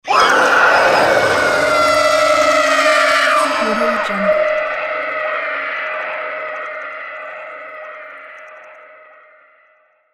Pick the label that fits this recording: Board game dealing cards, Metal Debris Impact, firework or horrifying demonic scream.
horrifying demonic scream